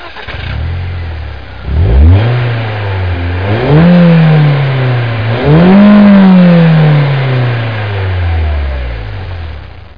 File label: BMW Roadster